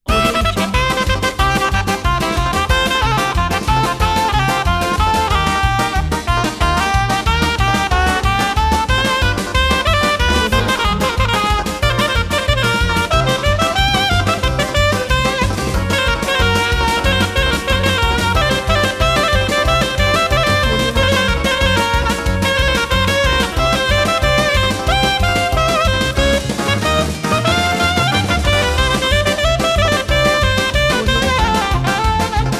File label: cartoon anime comedy